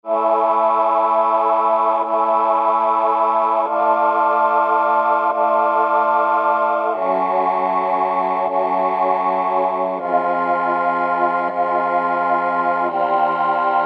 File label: Tape Choir, Korg microKORG; Factory Voices